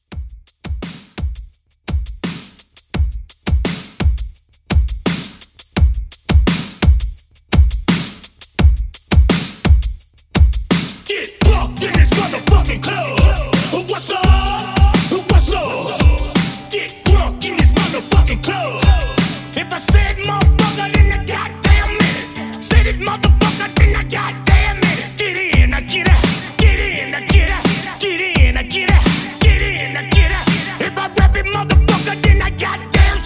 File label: Hype Re Drum